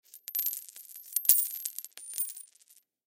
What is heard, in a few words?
Coin Drop Cement Multiple; Foley; Money; Objects; Blastwave